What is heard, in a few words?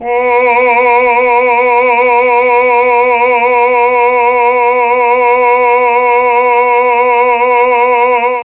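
B2 chamber male, mellotron; CHMB MALE VC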